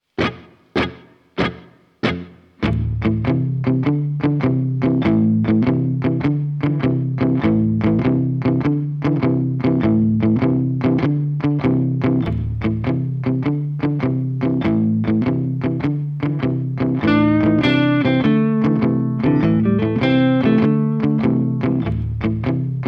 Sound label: blues loop; tb audio; KONG Lucky-Loop